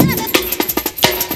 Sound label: break loop 175, Breaks (Loops); 'Effection' samples